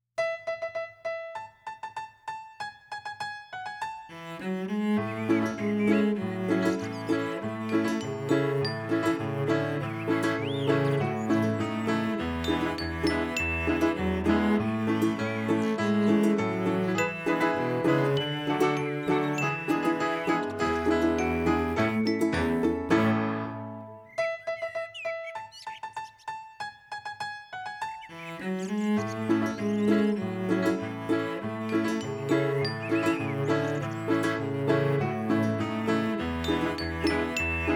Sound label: instrumental